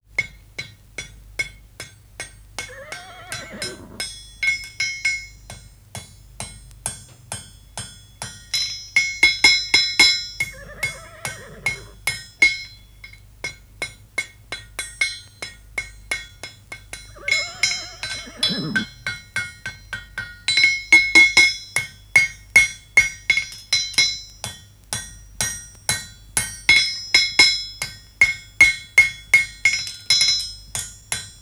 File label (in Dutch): hoefsmid met hinneken